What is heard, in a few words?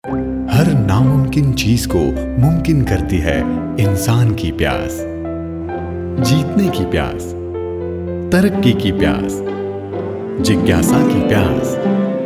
water jingle